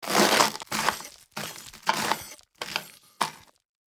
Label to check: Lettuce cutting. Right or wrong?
Right